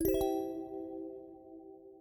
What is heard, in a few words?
button click